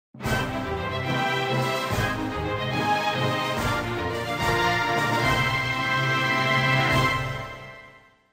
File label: victory music game